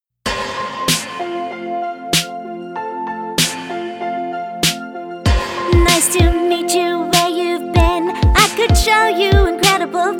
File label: singing